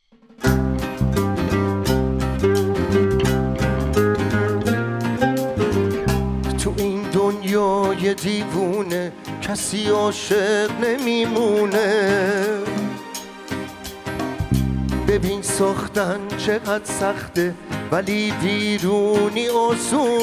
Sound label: Acoustic Version